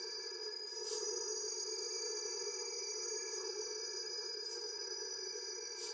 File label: ambience room secret room wisp fly loop